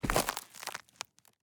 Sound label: footstep step dry grass shrubs pine needles meadow; Sonniss GDC